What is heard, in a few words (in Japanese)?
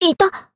受击